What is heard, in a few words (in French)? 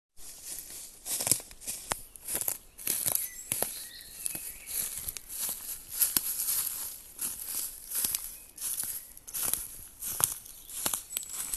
marche branche